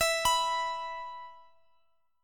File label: E 5th, Guitar, Standard; arpeggiated; x,x,x,x,17,19; down; capo=3